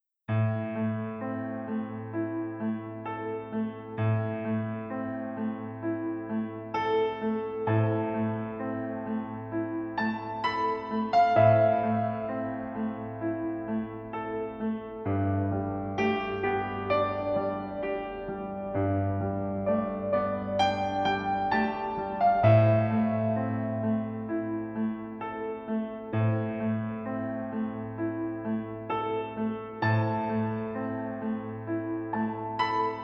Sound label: Welcome Piano